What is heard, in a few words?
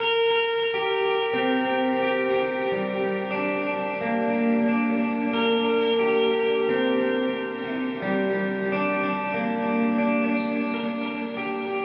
Cooper FX Arcades Reverse Granular